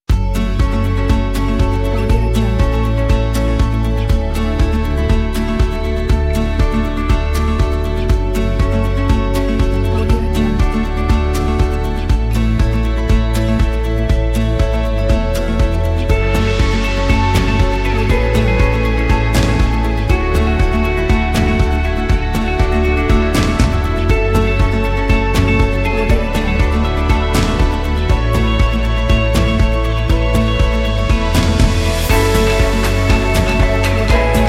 ambient indie acoustic

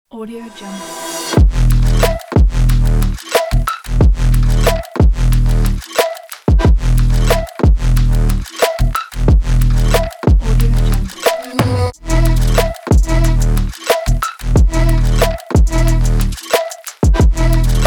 action trap sport beat

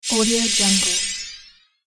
magic shimmer spell

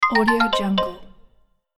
game over xylophone

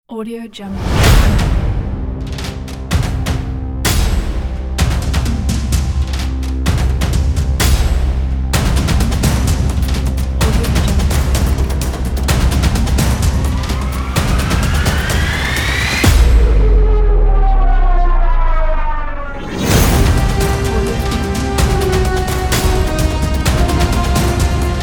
action drums trailer

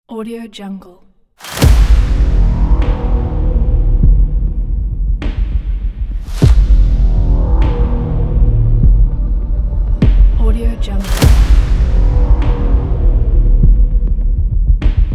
hybrid epic trailer ident intro

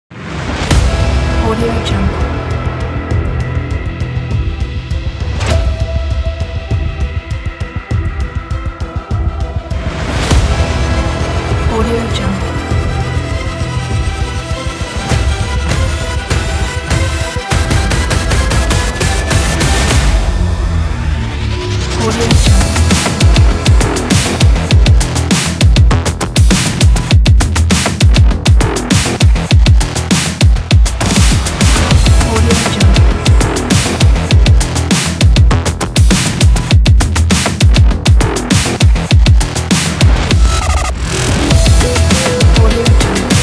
scifi action cinematic trailer; intelligentmusic

